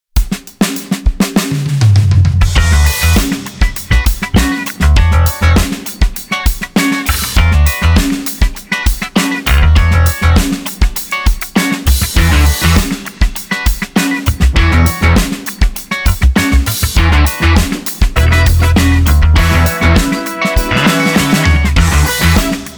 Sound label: bass trio